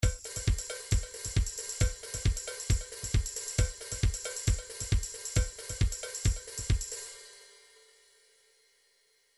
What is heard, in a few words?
trance midi loops beats